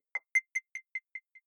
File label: button echo distorted high